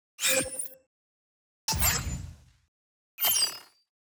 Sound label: Scifi Sound